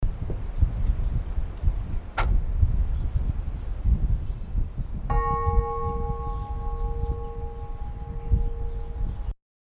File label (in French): cloche; Neuilly-en-Dun